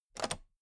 toolbox latch open heavy

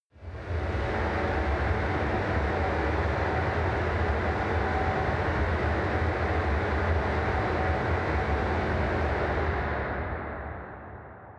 machine room

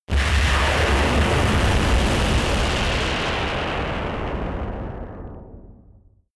massive bursting strike impact

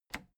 plastic button latch loose push disable

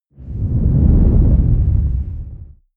whoosh large wing dark

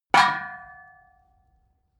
metal impact bucket solid

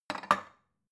bowl shelve

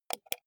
computer mouse wheel click

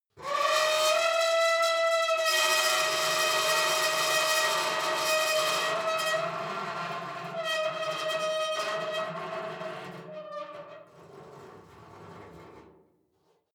metal squeal saturated long

